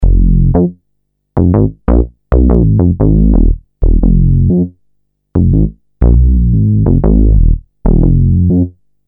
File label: minibass